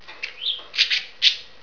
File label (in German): tschirp; Wellensittich